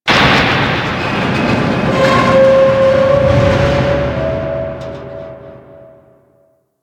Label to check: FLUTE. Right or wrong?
Wrong